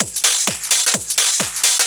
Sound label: Discohouse Loop 128 BPM